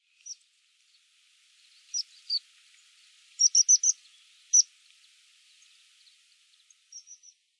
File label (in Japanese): tahibari, toyooka